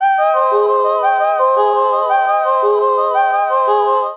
Chromatic Voice G Minor 115 bpm; MIDI Clips; Ableton; Factory Packs; Voice Box

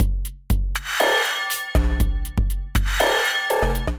GranularStretch Kit, Synthesized; Drums; Ableton; Factory Packs; Drum Essentials

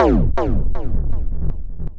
Bass; Eon Flux; Ableton; Skitter and Step

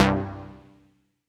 JP 8080 5th Stab; Novation LaunchPad Mini MK2; ableton; Launchpad demo project 2014

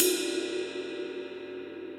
RIDE 22 edge tip; RIDE PE TL 22 edge tip; Ableton; Factory Packs; Drum Booth

